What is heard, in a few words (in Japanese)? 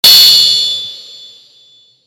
gakin